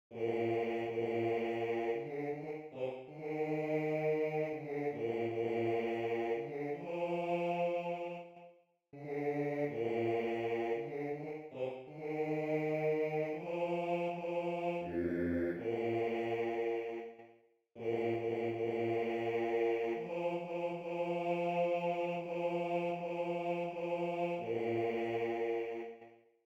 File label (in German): Bauernmesse Kyrie Bass II